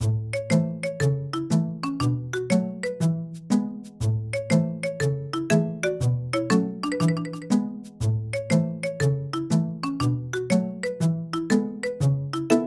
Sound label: cutscene common bright